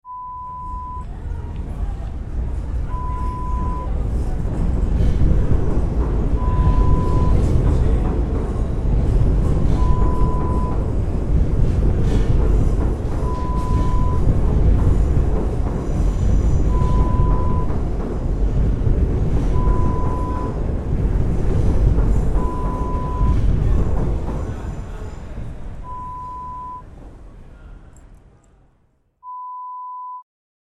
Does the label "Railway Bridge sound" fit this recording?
Yes